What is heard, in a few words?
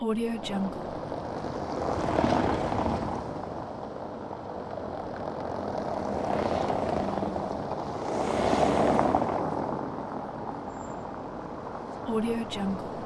cobblestone road traffic